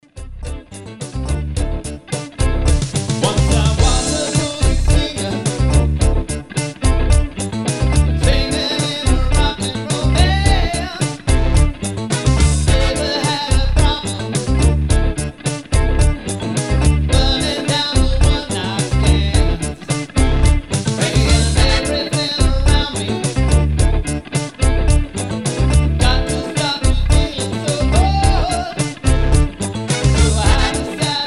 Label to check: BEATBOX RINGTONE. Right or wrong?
Wrong